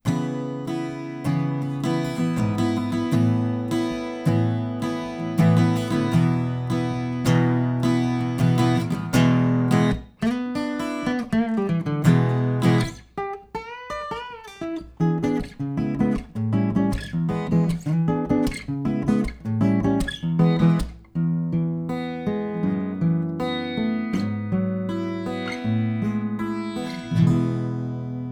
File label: Ac Gtr Shoot Gtr2 4038